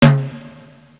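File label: tom high; FISHWISH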